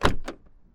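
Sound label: car door open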